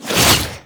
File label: Inf Transform Claws Whoosh, Infested